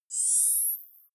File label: zoom out, signale